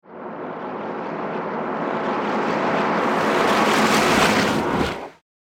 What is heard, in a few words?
Swamp Monster Attack Ambience; fantasy